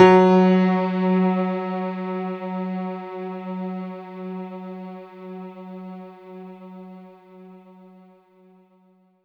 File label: F#3, synth; 90 s Sample CDs; NiceBeats; SynthLine VOL-23